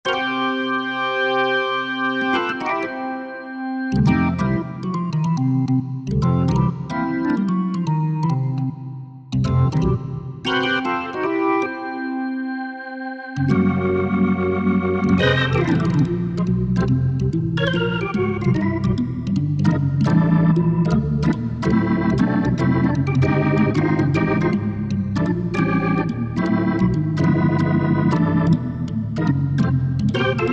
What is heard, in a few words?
Standard BX3, Korg TR-Rack